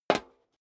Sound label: step high heels metal dry single right; menschen; schritte